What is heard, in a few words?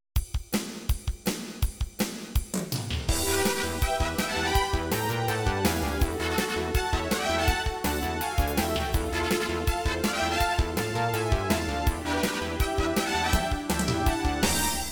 Bonus Stage; super street fighter ii the new challengers; roland sc 55; x68000 gamerip 1994